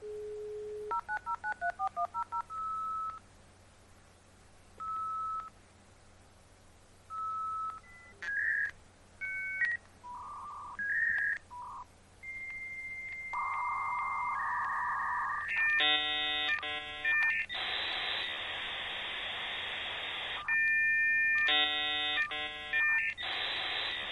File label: Dial Up Modem